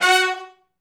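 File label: Hi Short Note (F#) unison, horn section, R&B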